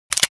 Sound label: shells out